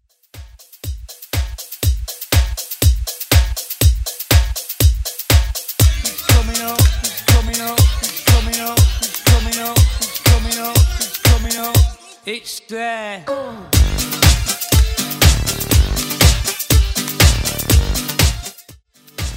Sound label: Redrum